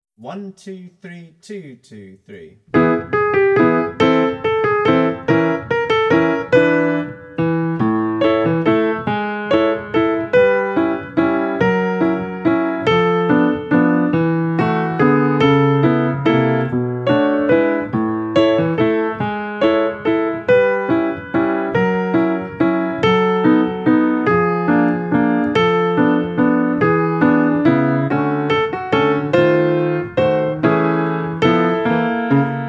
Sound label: Piano